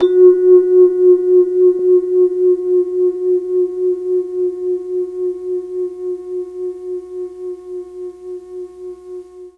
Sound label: F#2 VIBES, Mellotron MKII; Mike Pinder - Mellotron Archive CD